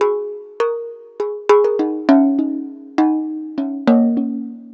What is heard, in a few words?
Jug Hi Melody